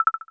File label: Clear, Samsung SGH-i600